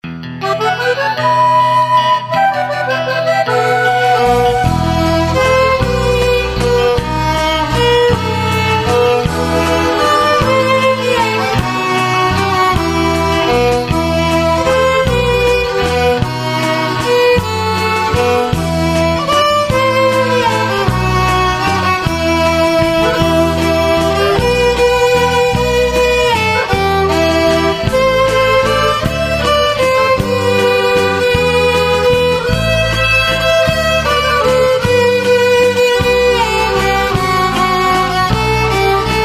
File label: Carpathian Waltz